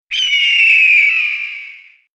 Eagle Sms

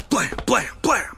Laser Noise

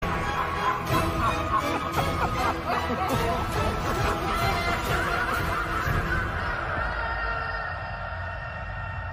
Maniacal Laugh; Joker

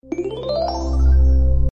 Chime

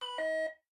Sensor

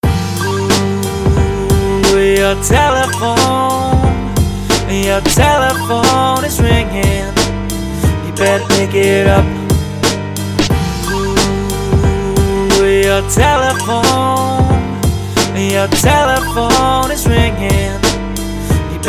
Chilled Hip Hop Beat

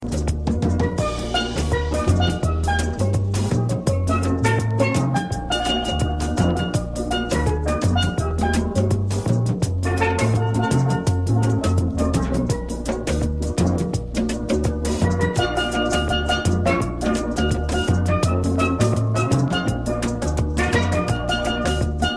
steel drums

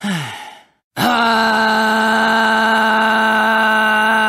Singing

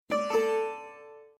Sms Guitar

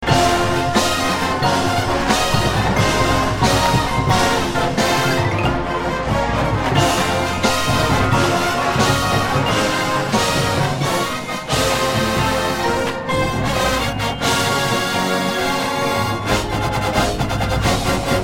Drum Corps

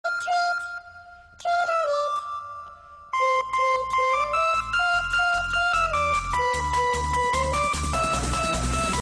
Funny Sms Tune

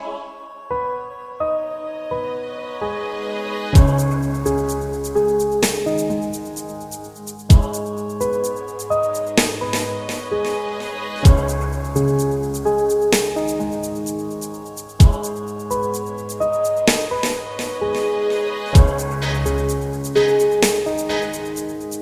Vintage Chill Vibe

